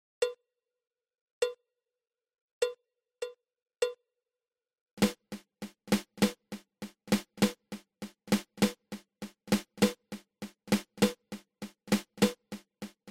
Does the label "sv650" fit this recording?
No